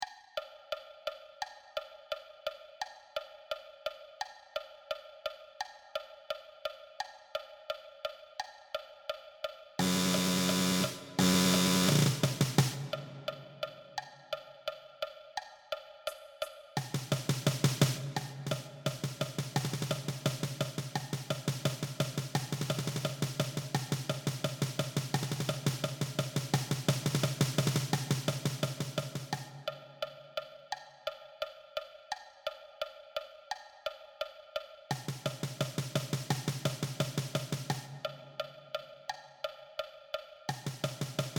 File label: Snare Drum